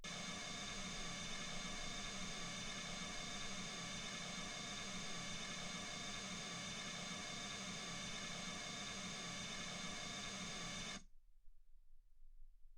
mls16 48kHz

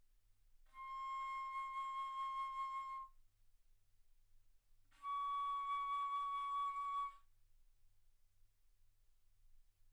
Bass Flute ff C6 Db6

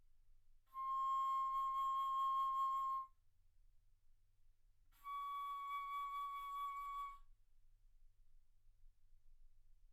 Bass Flute ff C6 Db6

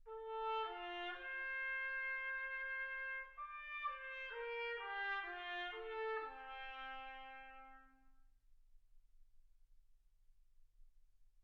trumpet

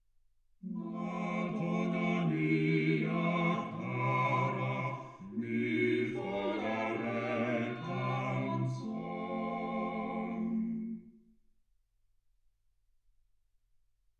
quartet

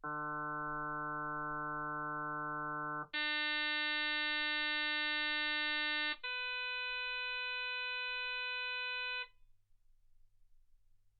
harm sinus 48kHz

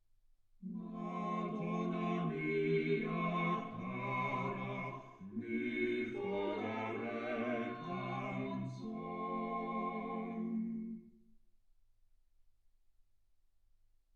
quartet

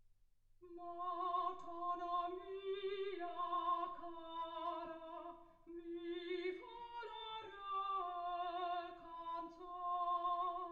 soprano

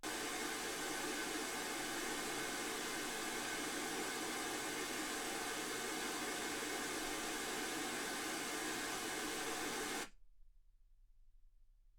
wgn

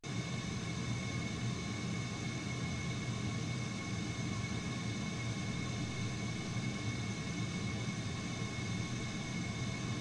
pink noise 48kHz

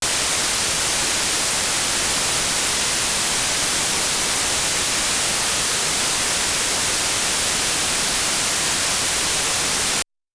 wgn 48kHz; OmniPower4296

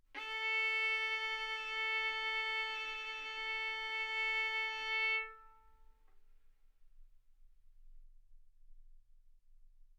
Violin arco A4 B4; mf; sul A; stereo

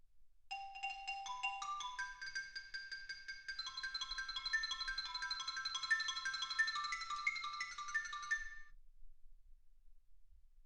xylophone

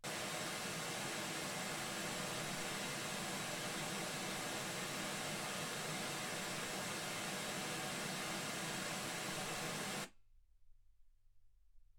wgn

